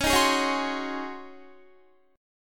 C sharp Major 7th Suspended 2nd Suspended 4th Guitar; C#M7sus2sus4; strummed